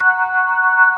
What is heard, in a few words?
HI SLOW F#2, ToneWheel Organ; E-MU Formula 4000 Series Vol. 3; Analog Odyssey